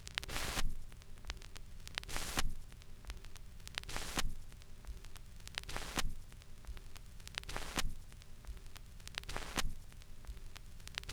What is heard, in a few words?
Record Loop, record fuzzies; Micro Pack